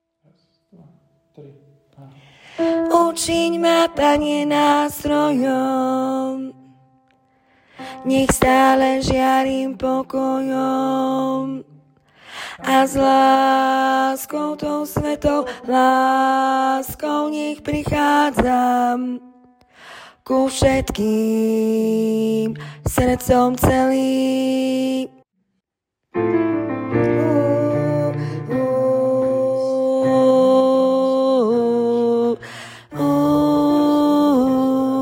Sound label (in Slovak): Ucin ma Alt